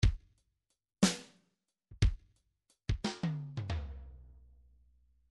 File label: midi file fills 3 4 fill; Bdf Midi File Fills